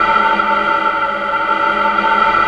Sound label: inhale; Spectrasonic Distorted Reality 1